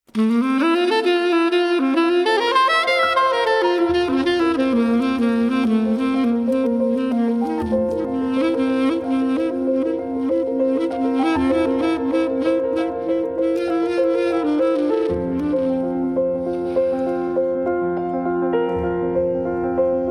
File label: Gloria Improvisation; Purcor